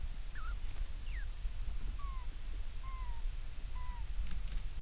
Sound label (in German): fiepsen, octodons